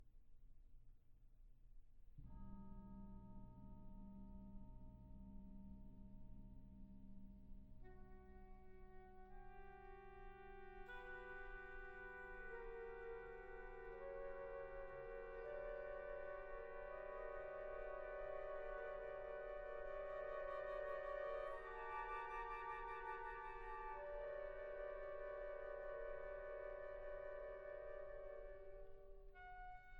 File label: Concerto for Wind Ensemble, Elegy